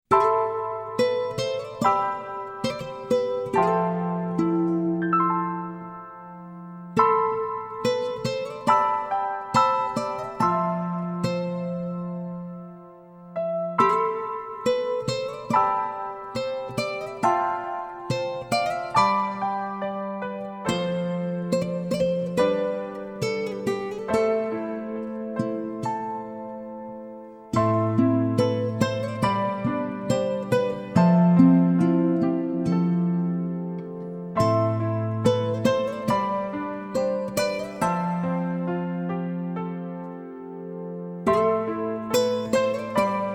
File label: Piano + Acoustic guitar version; Rurouni Kenshin Meiji Kenkaku Romantan Complete CD Box; animation